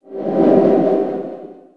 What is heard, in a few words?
cloak; d2lod